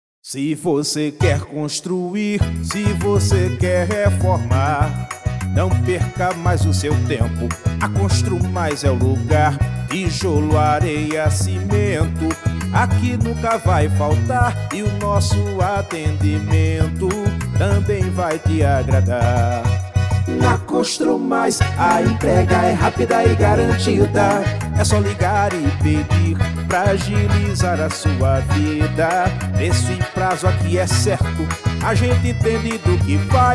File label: Jingle; Construmais